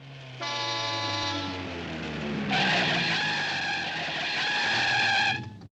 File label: car